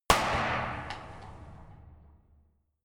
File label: gun slapping pop gun ii; schusswaffen